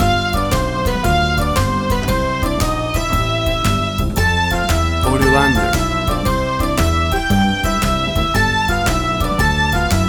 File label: Bouncy and Fun Music for Kids